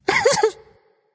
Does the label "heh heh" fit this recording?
Yes